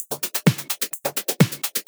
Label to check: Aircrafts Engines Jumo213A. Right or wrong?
Wrong